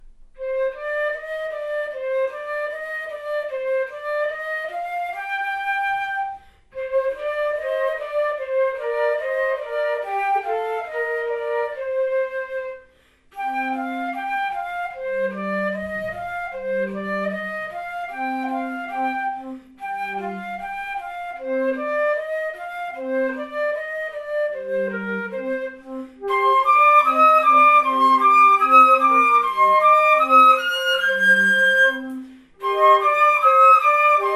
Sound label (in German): Querfloetentrio